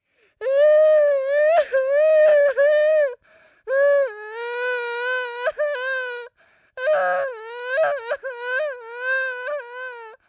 Cry Kid Wail, Cry, child, girl, wailing, pain, hurt, sad (human voice); Crying Sound Effects; Crying (Sound Effects:Voice); VoiceBox; Twistedtracks